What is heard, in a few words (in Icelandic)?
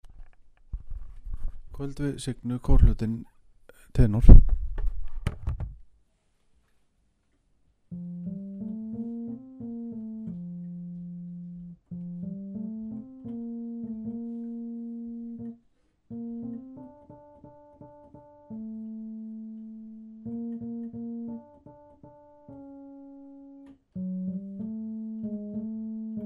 tenór